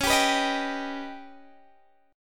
D sharp Augmented 7th C sharp, guitar

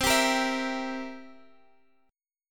B sharp 7th, B sharp; B#7; strummed

